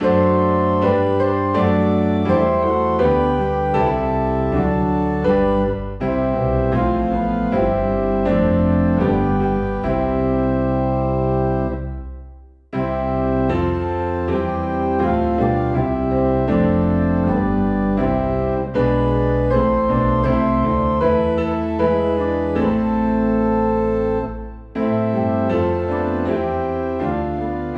Piano and Organ in C; Oh Sacred Head Now Wounded

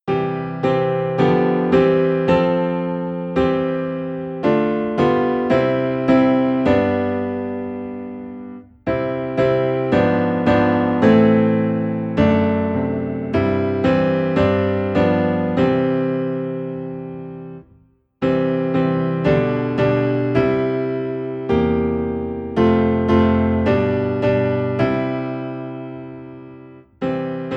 Piano Low in C, hymns; Like a River Glorious

